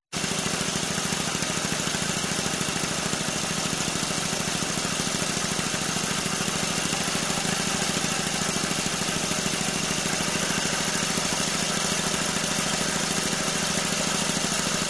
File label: traffic